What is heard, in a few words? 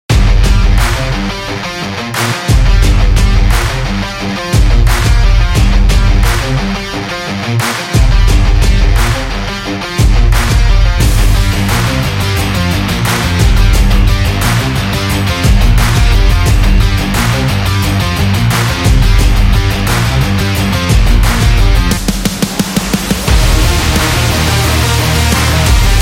Hard Rock Sport Action